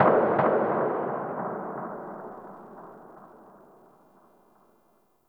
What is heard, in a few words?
Distant Gunfire; PVP